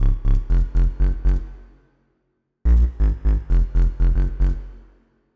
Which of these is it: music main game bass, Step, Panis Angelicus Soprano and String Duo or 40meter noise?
music main game bass